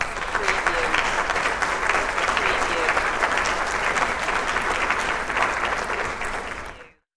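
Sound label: Large Crowd Applause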